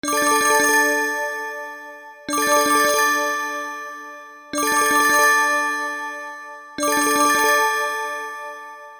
receipt

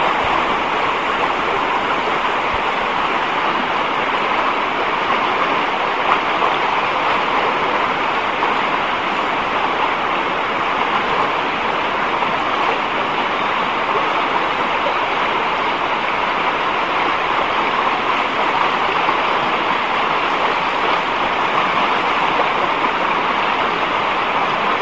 big river

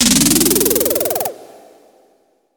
status up

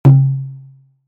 big hanko

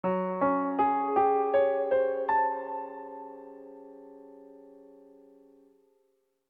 mystery

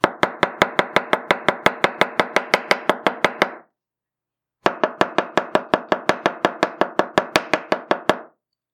knife

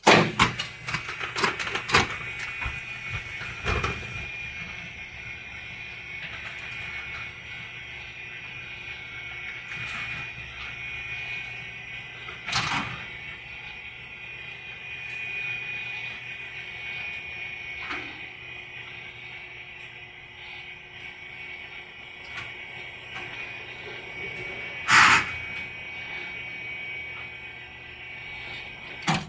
auto shutter

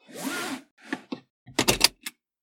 seatbelt

